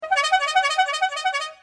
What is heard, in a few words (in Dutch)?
Tourtoeter